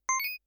power up; Kenney's Sound Pack; Digital Audio

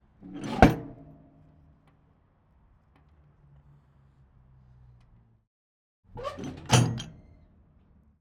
tiger ii hatch close slam and open tracking; MKH60; Pole Position

